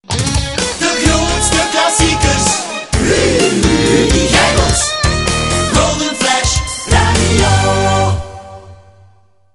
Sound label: jingle; Golden Flash